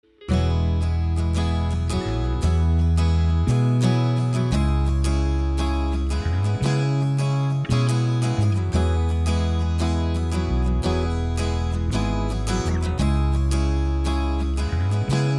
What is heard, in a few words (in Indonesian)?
Gitar Romantik